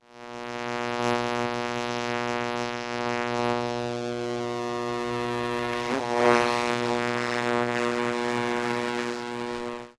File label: 60s cartoon bee buzz